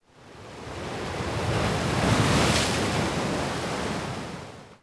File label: Ocean Waves; Ambient; Civ4 warlords